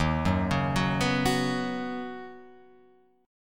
D sharp Minor 7th Sharp 5th D sharp, down, Guitar, Open F; D#m7#5 arpeggiated